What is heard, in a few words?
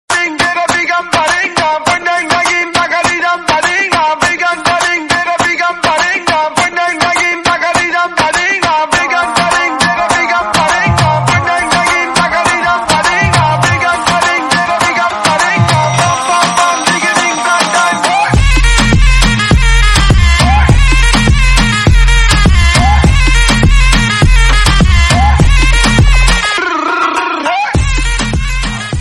dj remix hip hop club music tone ringtone